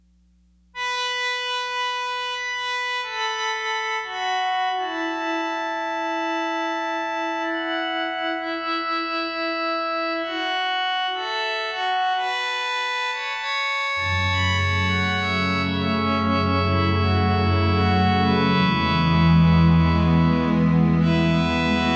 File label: Sheng Lullaby